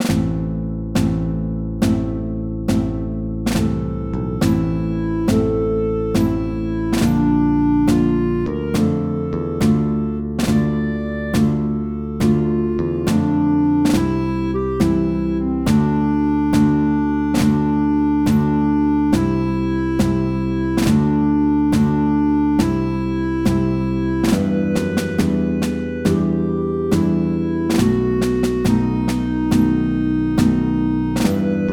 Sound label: Work Song; MTWFC VOL1 Audio Accompaniments